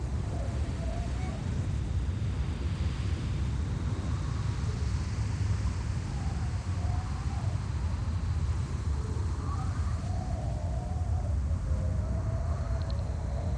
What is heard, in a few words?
Ambience; Sally